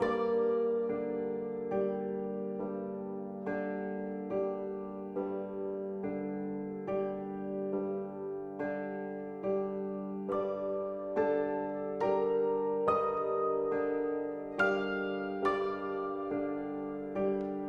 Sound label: Loop Eerie; the walking dead michonne